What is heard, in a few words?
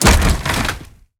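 wood double rough mine open, door; wood double rough 02 mine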